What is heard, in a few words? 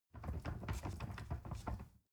ladder wood rattling long